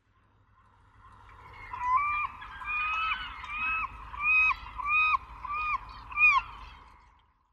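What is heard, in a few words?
Vega Gull; choushi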